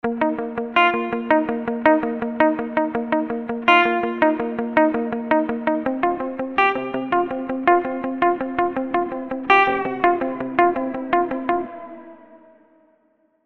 Gitarre Morphing FX Glide Modulation

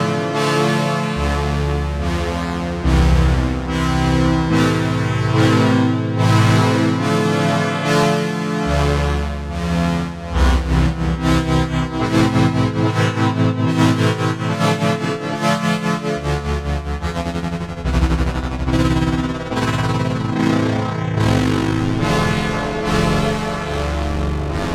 Ringmod kurzer Test DDRM Expander

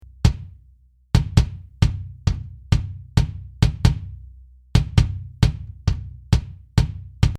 BD ersetzt

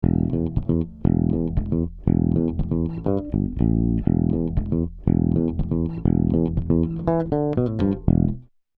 E-Bass Kompression 2 zu 1

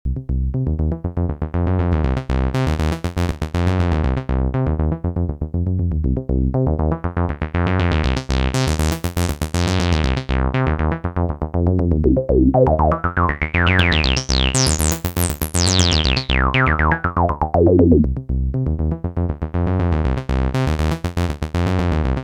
filtertyp

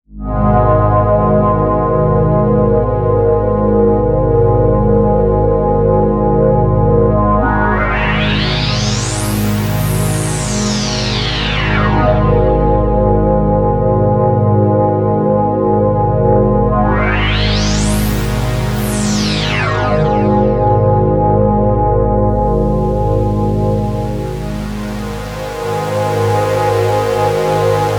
OB-E Filter Mode Resonanz maximal

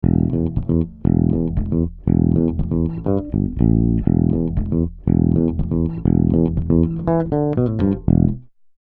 Tubesessor Bass Kompression; Heritage Audio

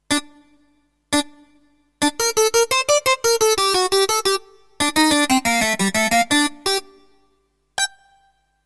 Harpsichord Release ohne Key Off Trigger

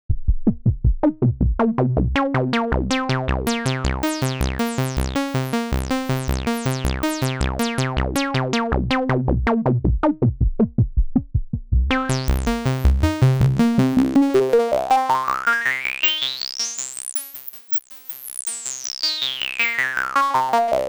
LC MS Saw Sequenz

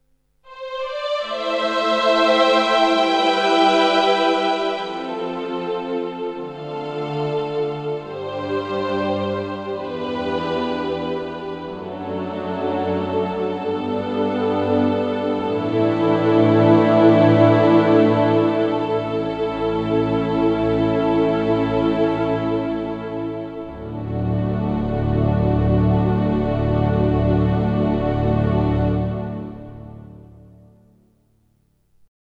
Streicher

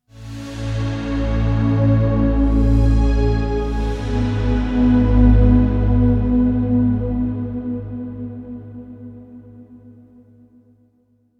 Hauch Flsche

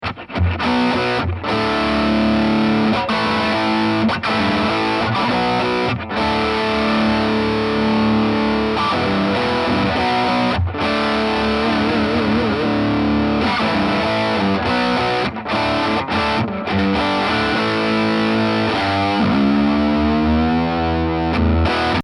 halbe zerre steg british

